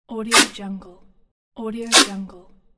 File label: tearoff sticky tape quickly